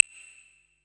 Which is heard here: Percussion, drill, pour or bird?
Percussion